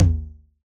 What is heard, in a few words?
Tom, Yamaha DJX 2B